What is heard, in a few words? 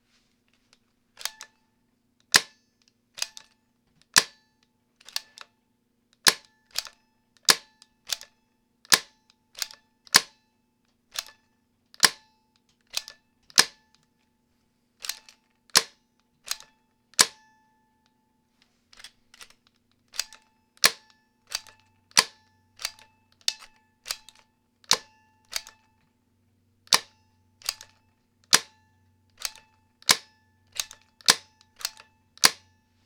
carbine cock short barrel indoors, guns; pro carbine; 68 carbine